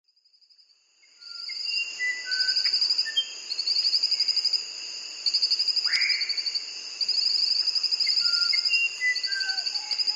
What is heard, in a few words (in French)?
oiseaux